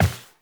Step Default, Footsteps, Walk; Warframe; Tenno

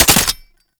Belt Fed Rifle Mech; Warframe; Tenno; Frumentarius

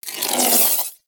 Ball Held Spark; Warframe; Missions; Tenno Ball

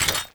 Footstep Default; Warframe; Hoplite

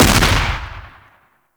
3D Fire, Weapons; Hek

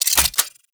Pistol Reload; Thanotech

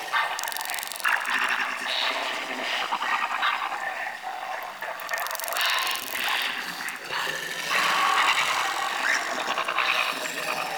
Landscape Mushroom Forest Loop, Ambience

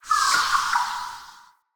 flock projectile whizzby; Enemy; Abilities; Thawtide Hunter; Sinecure; Ranged Hunter Thawtide

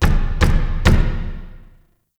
Knock; Shadowgrapher; Warframe

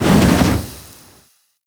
Fire Shot Loop Stop, weapons; Lizzie; Warframe

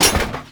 Metal Crate Hit; Warframe; Tau; Destructible Dynamic

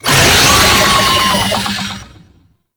Boss Vocal Alerted; Enemies; Vocals; Warframe; Oraxia

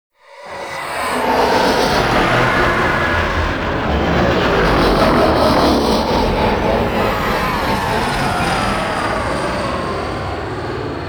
Level Intro Docking, Vehicles; Warframe; Liset; Zariman

